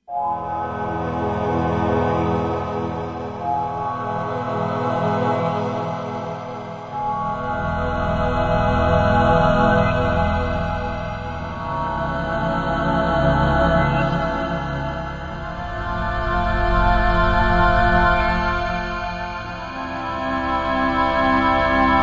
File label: St.Michael, Yamaha TG55